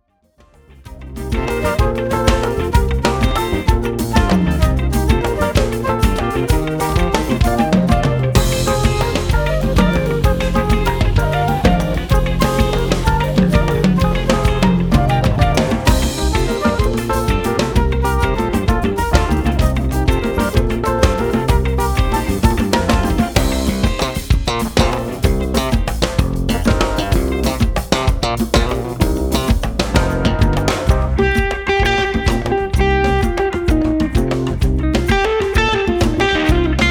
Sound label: Black beat; Paul Prignot